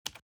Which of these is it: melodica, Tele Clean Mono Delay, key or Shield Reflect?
key